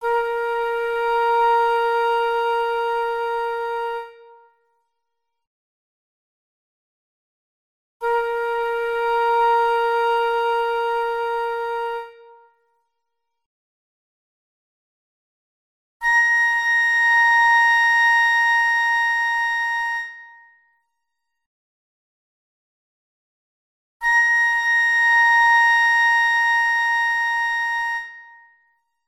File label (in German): Stimmtöne B